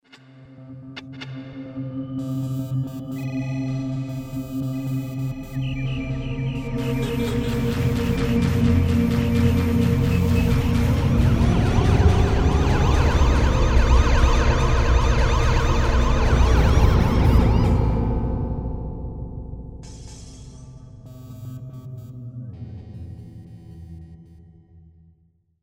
fx bad dream

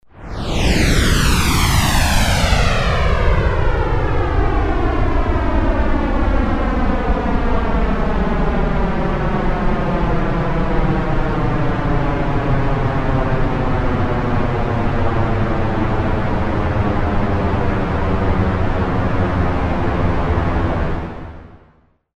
fly wave

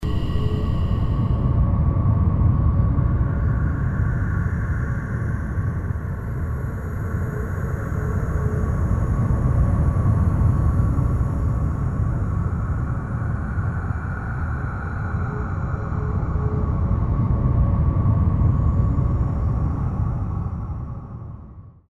spaceport

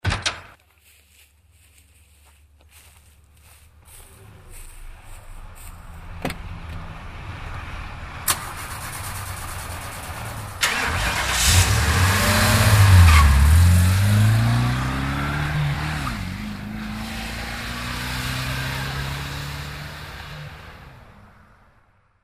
autothief

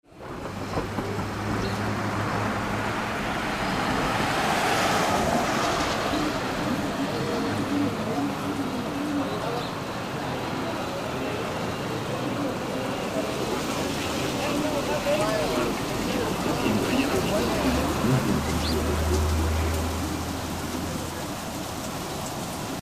water suite

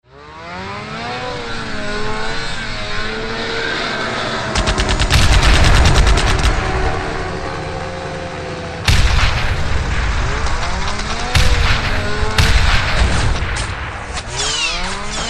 fx biker war

